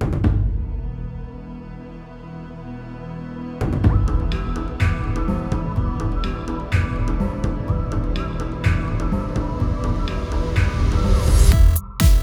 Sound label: Instrumental; Big Chungus